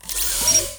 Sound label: Peace idle; PACHI; Mob